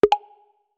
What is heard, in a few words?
Settings Updated; Standable Full Body Estimation